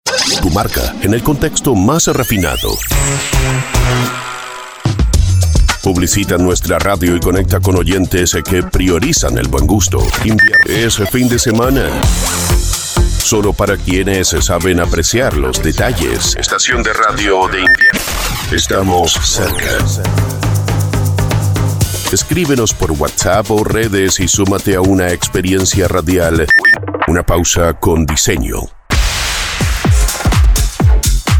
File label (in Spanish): Arte Ambient Invierno; invierno 2025